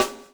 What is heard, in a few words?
SLICK, Backbeat